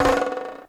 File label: roll, percussion; Misc Percussion